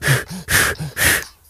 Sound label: breath